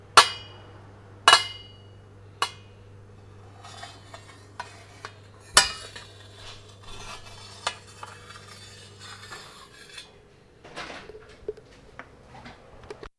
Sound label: Metal Tapping